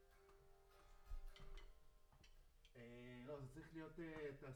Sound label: Room